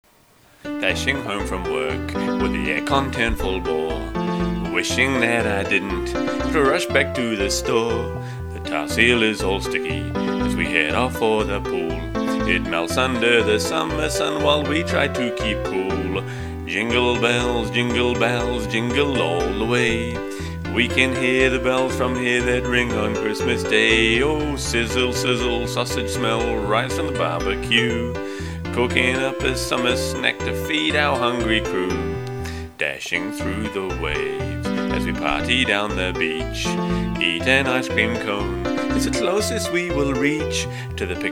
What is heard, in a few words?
Jingle Bells